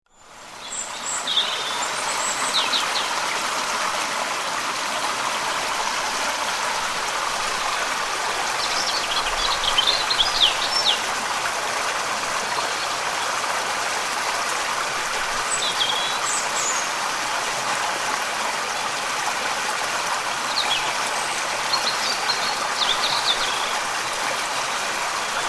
Woodland Stream, Flowing woodland stream with birds; Rivers; Nature